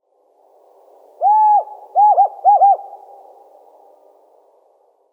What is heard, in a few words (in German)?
Fahlkauz Strix butleri; Vogelstimmen